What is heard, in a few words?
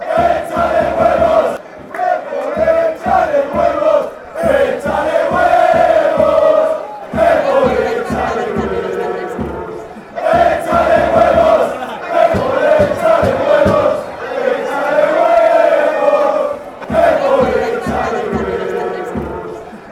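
break, football songs and soccer chants; Real Club Deportivo de La Coruña is from the city of A Coruña, Galicia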